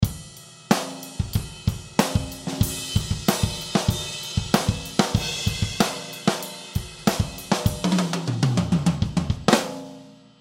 Drum Beat Medium, Drum Beat Medium Tempo Laid Back Groove; Drum Kits; Blastwave